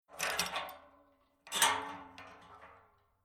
Industrial Petrol Jerry Metal Can Opening Lid Liquid Sloshing